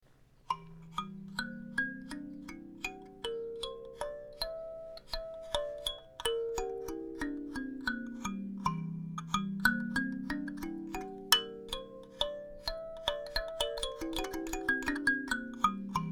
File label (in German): klangbeispiel daumenklavier